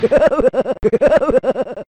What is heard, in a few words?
laugh